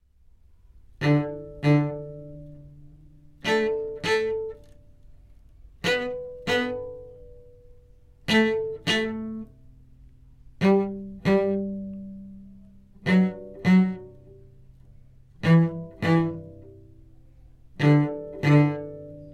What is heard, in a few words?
Twinkle Stop Cello